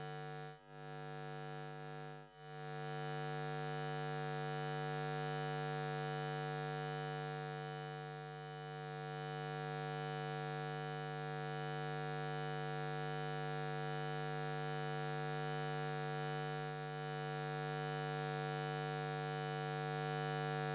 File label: Electromagnetic Field, Electro; electric fields